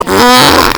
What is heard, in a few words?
fart ripped